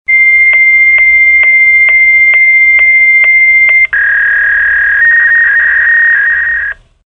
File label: fax call